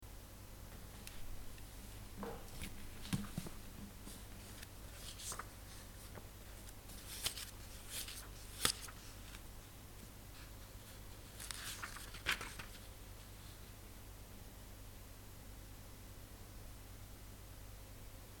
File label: Looking Up A Word In The Dictionary